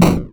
hit

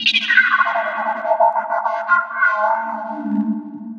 Cave Pan; FREE SFX PACK VOL 1

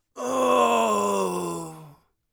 death, Warrior Voice; Warrior Voice Pack

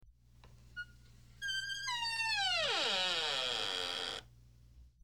Creaking Door; horror sfx vol 1

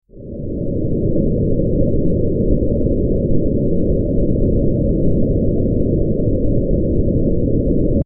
Ambient Wind; horror; horror sfx vol 1

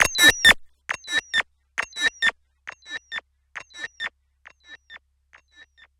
Delay Call; FREE SFX PACK VOL 3

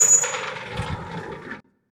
weird, sci-fi; sci-fi sfx

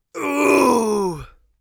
crit, Warrior Voice; Warrior Voice Pack

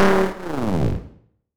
Retro Roar

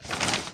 paper

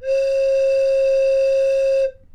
Sustain Low, Slide Whistle

